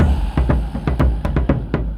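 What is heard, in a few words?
Music Shield; From Dust; ps3; xbox 360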